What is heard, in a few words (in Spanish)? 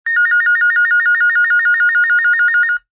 llamada telefonica